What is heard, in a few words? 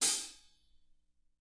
hat, drum